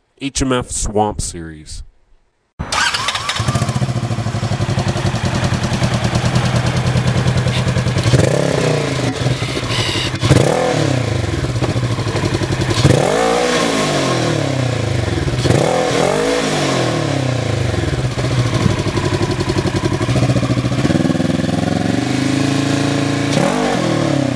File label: pranger full sound